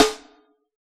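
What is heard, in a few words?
acid jazz kit snare; Dr 202 Kit 1; Percussion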